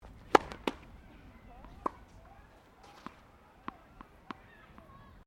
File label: TENNIS SERVE FEMALE LET, Local tennis club; Tennis Sound Effects; Sports